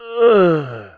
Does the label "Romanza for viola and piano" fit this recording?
No